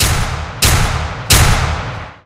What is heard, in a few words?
powerup